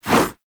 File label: Melee Whoosh; Warframe; Sentients; Brachiolyst